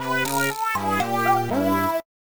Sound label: Lose; Wario Ware Touched